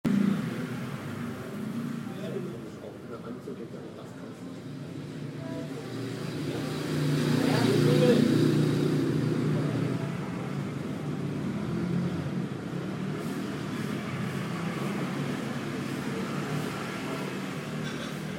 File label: the sound of sea, cars, mopeds and sweeping